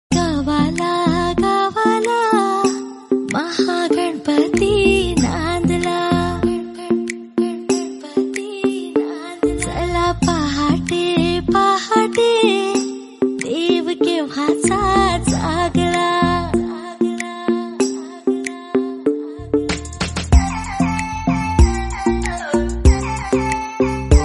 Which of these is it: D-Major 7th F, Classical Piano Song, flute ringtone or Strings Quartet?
flute ringtone